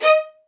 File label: D#5, LOUD VIOLINS; Q Up Arts; Denny Jaeger Master Violin Library